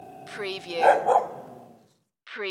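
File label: Big Dog Bark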